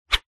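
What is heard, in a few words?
leisure fishing rod swipe cast air whoosh; zapsplat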